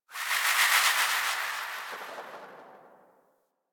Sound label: Gas City Distant Wind Textures, Ambience; Warframe; Corpus Gas City